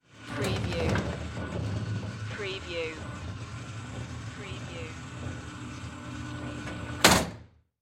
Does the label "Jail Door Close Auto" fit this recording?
Yes